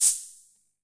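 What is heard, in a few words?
Olson Cym A, cymbal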